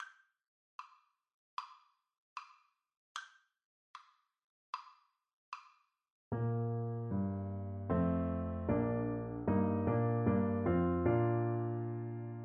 up good, Trumpet

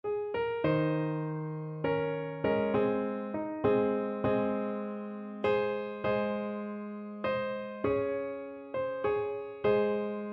Tenor Horn

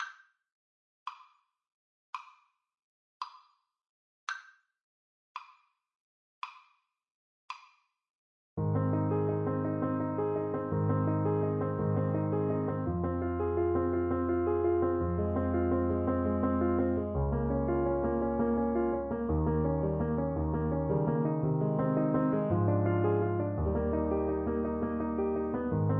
French Horn

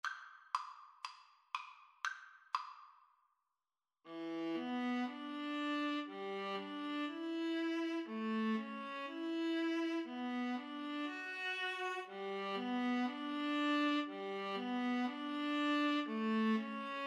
Violin Viola Duet

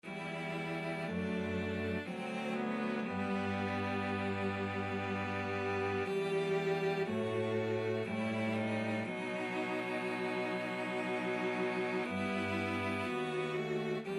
cello trio; bach; come sweet death

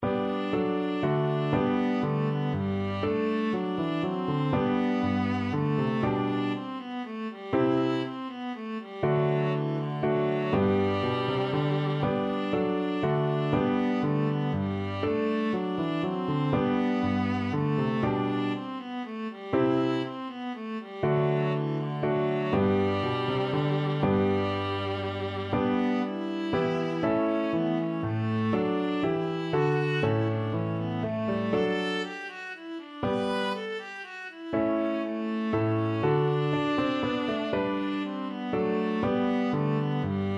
minuet, viola

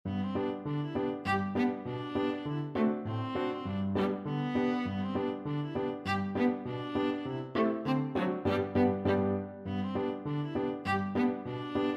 pincushion polka, viola

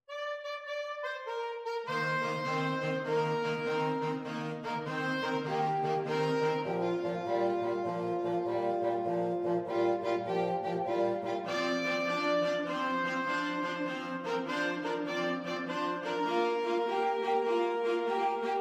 woodwind trio

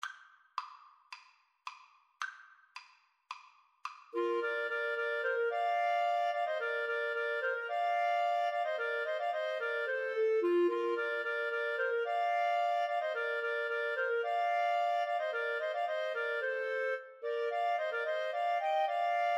spring, Clarinet Trio

